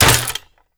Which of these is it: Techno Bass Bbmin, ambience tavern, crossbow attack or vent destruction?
crossbow attack